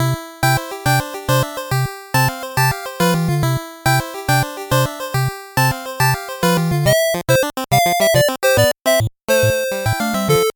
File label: 8bit bgm slow